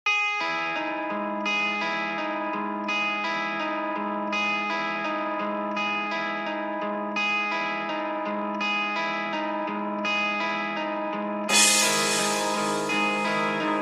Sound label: Distortion